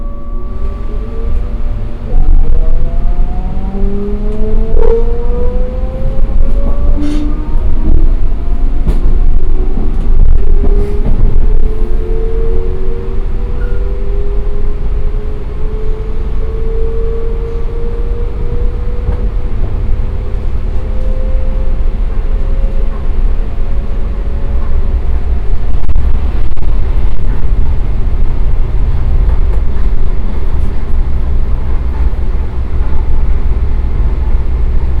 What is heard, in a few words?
Inside Train, Binaural, Background Noise; SymmetricArray